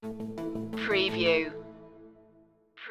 slow dawn stinger

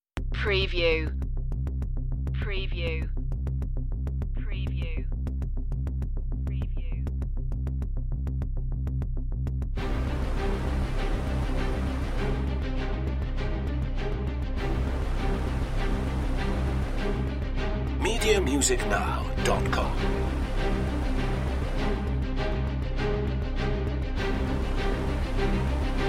aftermath no perc

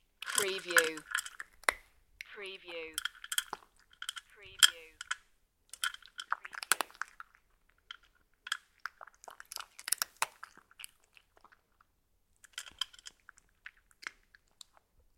Cat crunching biscuits and chewing; beanstalk audio

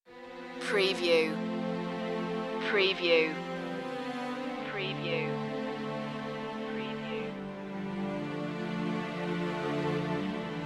heroic tale no choir version

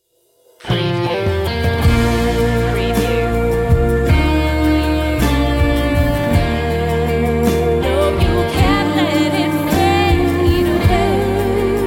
vocal version

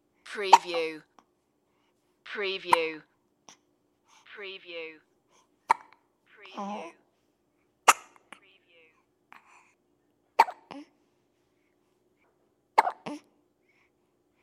Baby Hiccuping; Human Sounds / Other / Misc Sounds

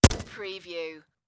Machine Gun HKG36

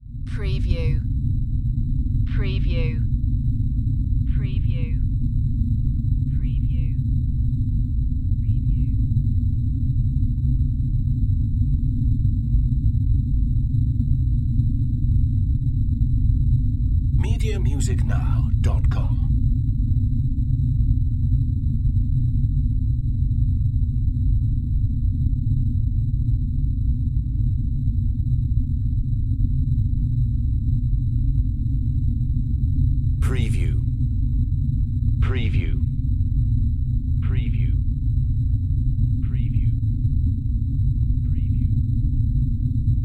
Underwater or Deep Space Dark Ambience

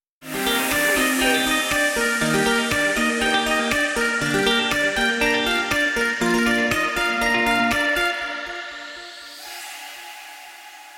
funky stinger; media music now

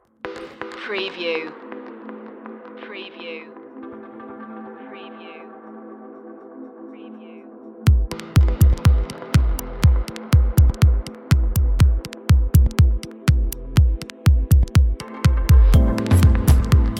underscore

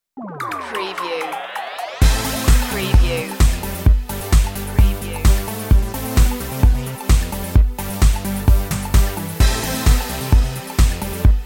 synth

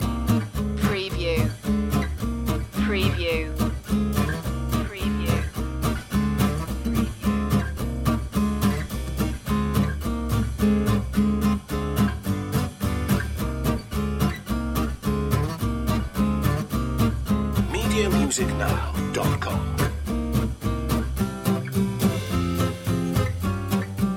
a minor swing underscore

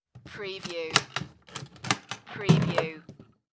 Projector Case Open

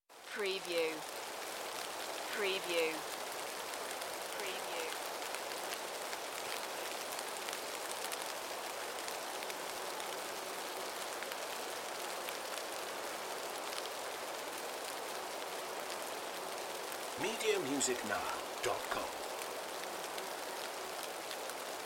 Forest - Heavy rain; Beddgelert Forest; beanstalk audio

